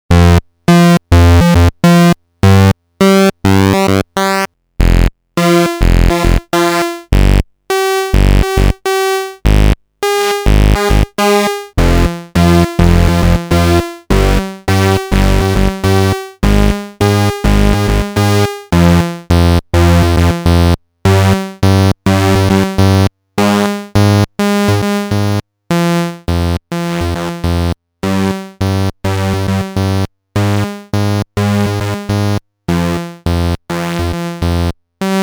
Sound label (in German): vermona perfourmer spielmodi und panorama-automation